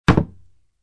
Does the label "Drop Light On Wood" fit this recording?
Yes